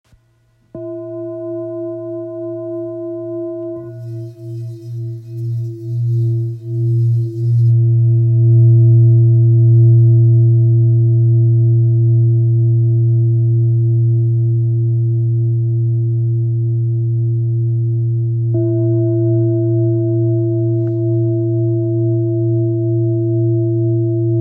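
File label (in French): Bol therapeute la diese, bol chantant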